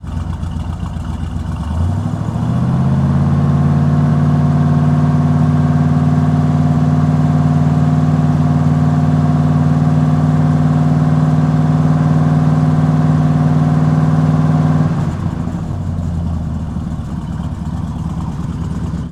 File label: 68 Road Runner Fast Idle, Automobiles